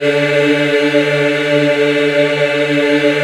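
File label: D3 men women eh, Choir; USB Soundscan vol.28; AKAI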